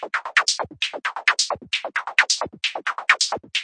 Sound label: 132 bpm, fast filter hats, drum loops and breaks